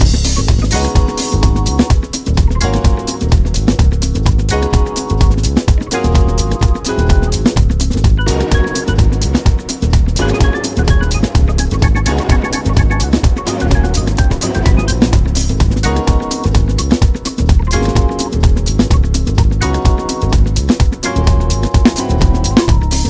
Instrumental

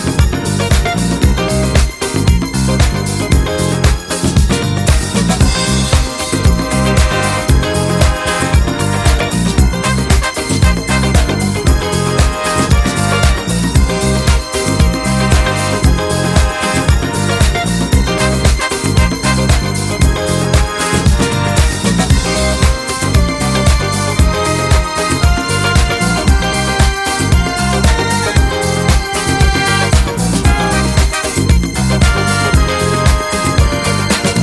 instrumental

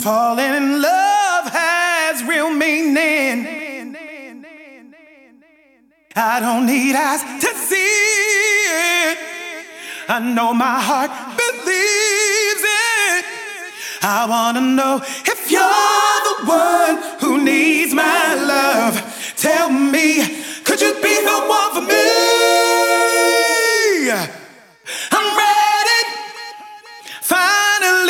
Accapella

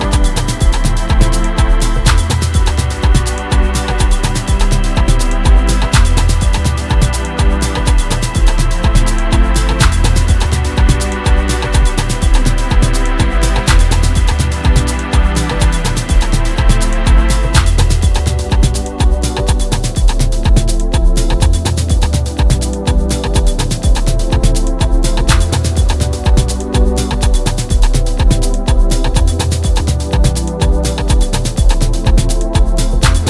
Instrumental

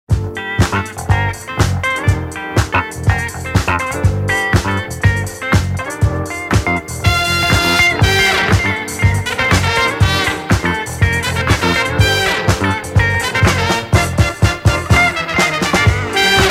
Instrumental Mix

